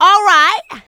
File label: vocal house adlibs; PS-28 Vocal House Samples